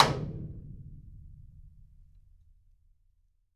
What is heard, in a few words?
Rim Room Stick, Percussion One Shots; Essential Percussion